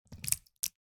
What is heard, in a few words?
horror guts bloody splat squelch on carpet floor; sound effects one